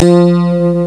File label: guitar